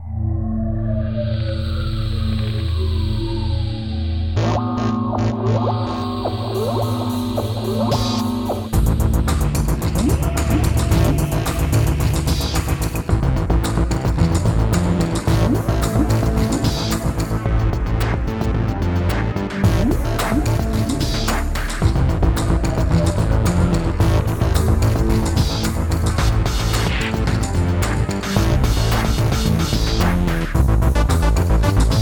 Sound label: Bkgnd Cave B; Neuro Hunter